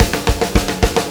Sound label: PULL, Backbeat; Spectrasonics